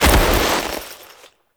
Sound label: Foley Roll; Warframe; Kahl